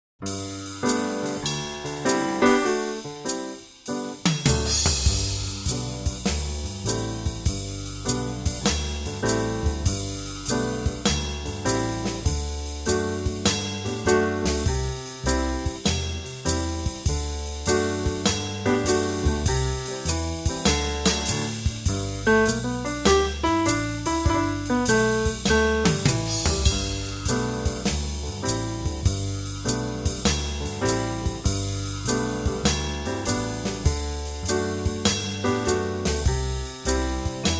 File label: jazz session; J.S BAG